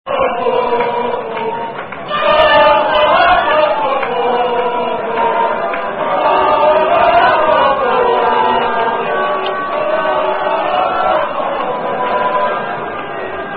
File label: French Football Chants; Chant2 Raf Vs Viilemomble